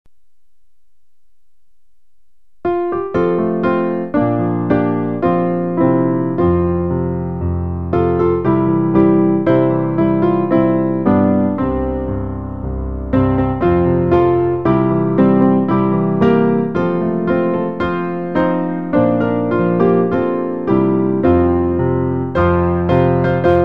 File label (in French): piano